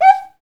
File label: PRC XCUICA, Skin Drums